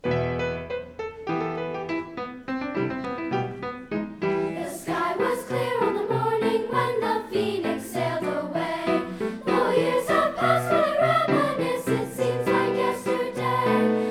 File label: 6th grade choir; tfms choir concert